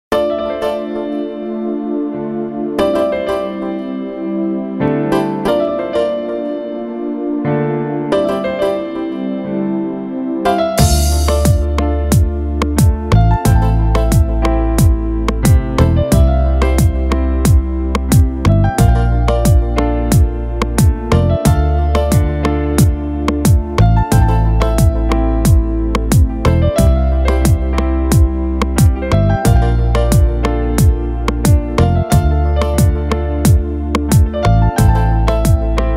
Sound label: Piano